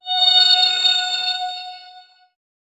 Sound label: blue magick ethereal boun, charge